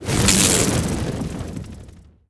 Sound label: jump, antlion wings; creatures